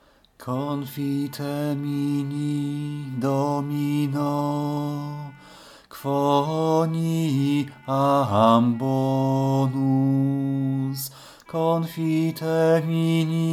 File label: alt, Taize; Confitemini Domino